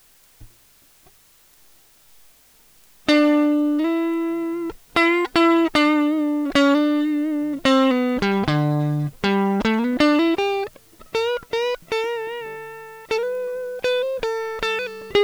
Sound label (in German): Gtr Melodie